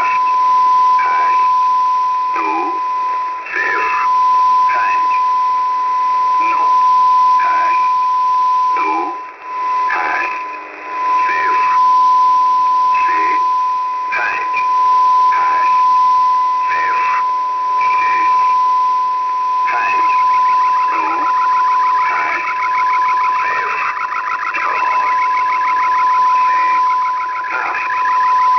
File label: 7910.00 usb, un emetteur radio; v32 un emetteur radio dans la guerre